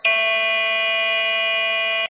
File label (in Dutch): snaar 5A; tonen; The A- tune is a standard for instruments and orchestres in the most